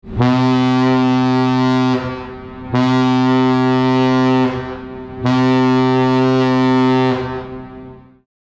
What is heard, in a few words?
horn, shipping; antarctica; transportation; nuyina; rsv-nuyina